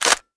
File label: m4a1 bolt release, weapons; csgo